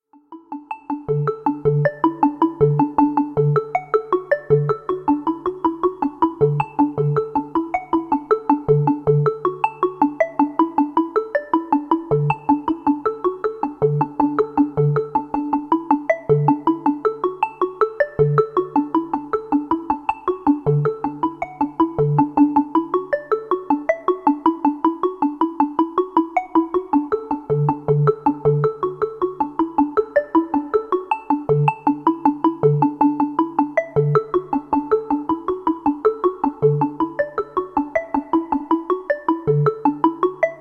subtle chorus percussive modes; jurgen Haible

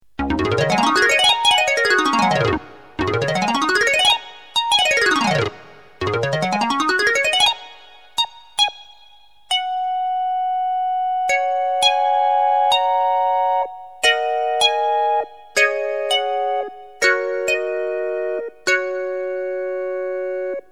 glass, polykorg; jurgen Haible; synth

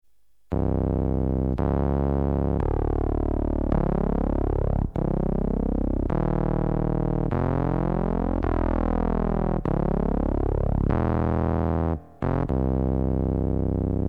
synth, polykorg; jurgen Haible

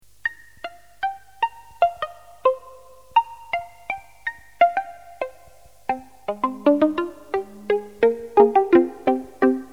nylon; jurgen Haible; synth

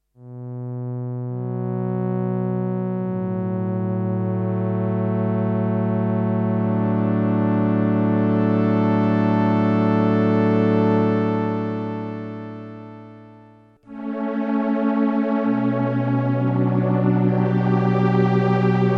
string ensemble just strings; jurgen Haible; triple chorus; synth

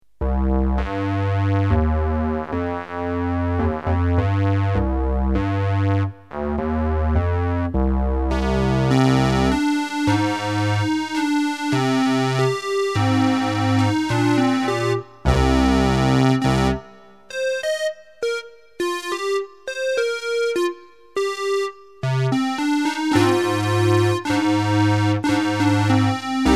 two squares; jurgen Haible; synth

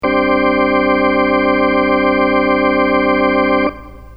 organ c2; jurgen Haible; synth

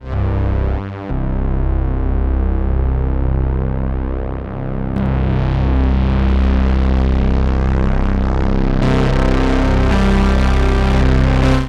bass chords; interpolating scanner and scanvib; synth; jurgen Haible

